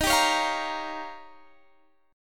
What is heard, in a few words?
Eb 9th; strummed